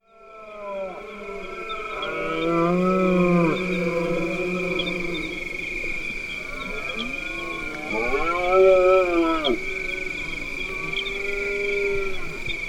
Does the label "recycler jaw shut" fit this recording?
No